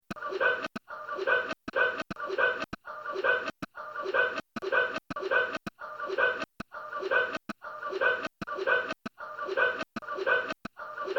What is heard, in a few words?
hickup